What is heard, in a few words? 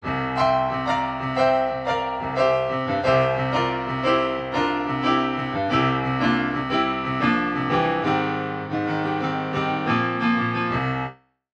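piano early reflection, reverb; 钢琴早期反射; Waves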